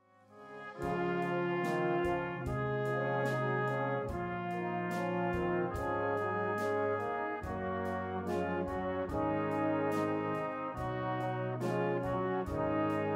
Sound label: Cornet; Cornet Audition Example; salvationarmy; Audition Tracks; Brass 2025